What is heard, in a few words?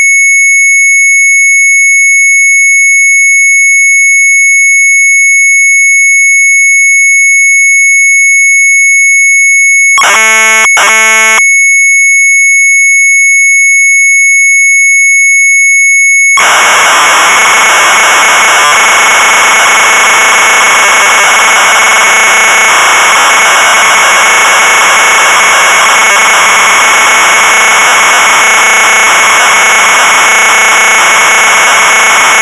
MZ800 Tape, sharp; FRUITKAST; retromelsarchive